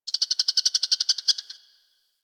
Zone Tree Critters; Ambience; One Offs; Warframe; Eidolon Plains

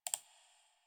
Computer Mouse Click Misc; Warframe; Ambience; Nineteen Ninety Nine

